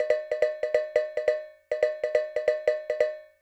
Saucepan Solo (140BPM); Loops

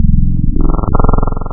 MOOG, wavetables; Classic Synths

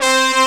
Opening loop; SNES; Dragon Ball Z Super Gokuden - Totsugeki-Hen

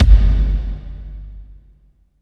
KICK, Tekno Industrial; East West

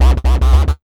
ROCK 132 FX, Big Beat Drumloops

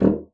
D#1, bassoon; Xsample VOL-6; C BASSOON

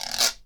GUIRO

